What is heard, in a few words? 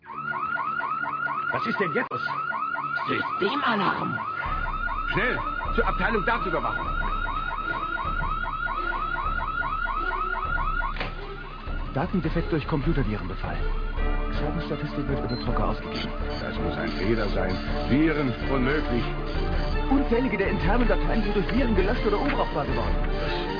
hfunk